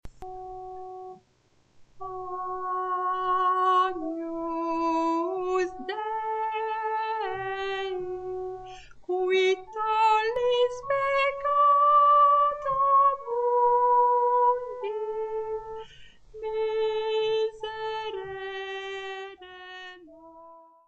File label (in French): Agnus Soprano